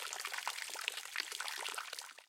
leaking; pipe mania

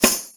gameover; knife flip

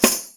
gameover; knife flip

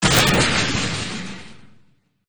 kick; traffic run

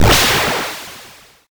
knockout; footyzag

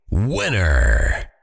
winner; brawlguys

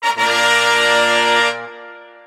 tada; crazy eights

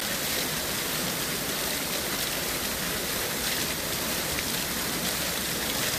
geiser fountain blast; steam camp

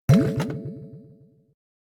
juice; fruit master

